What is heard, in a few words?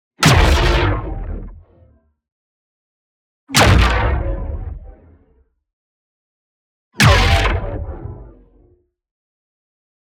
EXPLOSION LARGE Hollow Finisher; boom library; Matter